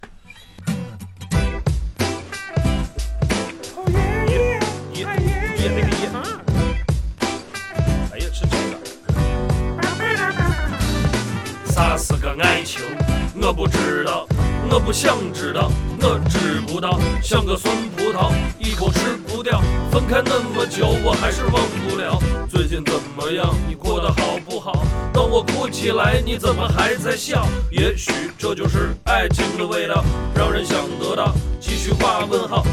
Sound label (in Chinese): 说唱